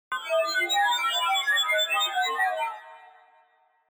UFO futuristic Feeling

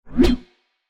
von sword

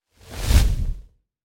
zuban fire

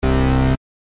incorrect

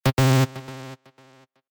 Wrong

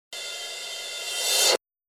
Long cymbal fade in

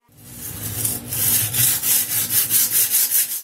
Sharpen a knife

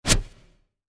shu

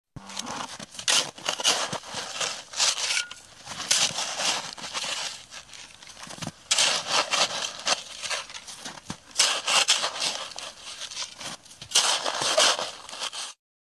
Snow clean

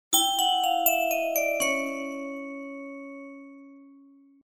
Music box

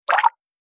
picha water

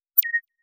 pin

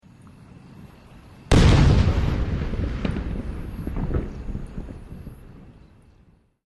Oversized Fireworks

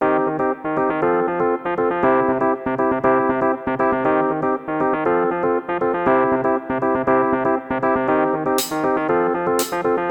Funky

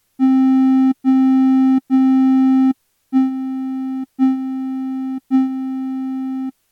sustain

